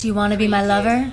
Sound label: Lady Vocal